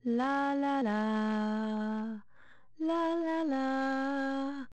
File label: Lullabie; Imagine Babysitters; Imagine Baby Club